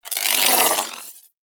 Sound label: Ball Held Spark; Warframe; Tenno Ball; Missions